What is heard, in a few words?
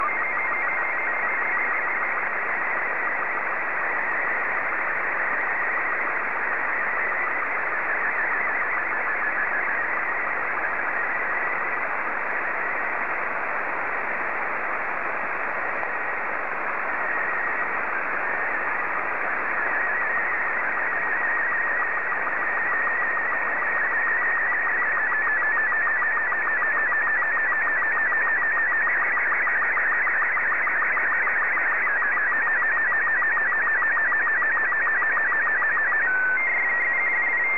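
Robot 36, slow-scan television; VK3DFL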